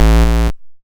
YM Bass A#m; CHIPSHOP HITS

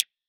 Tech Drum Hit, techno 120bpm; musicradar; Drum Hits

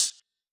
Tech Drum Hit, techno 120bpm

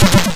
Snare, YM, CHIPSHOP HITS DRUMS; ChipShop Deluxe

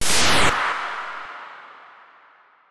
White Noize, techno drum fx; Single Hits

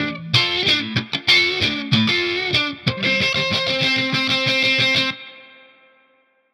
Guitar Line, hiphop; musicradar; 94bpm

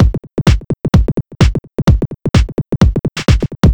Beat 128BPM (Drums), techno; techno drum fx

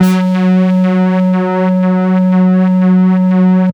Tech Bass F#1, techno; musicradar; 120bpm; Bass Multi

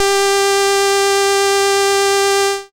SID lead G; ChipShop Deluxe; ChipShop Hits

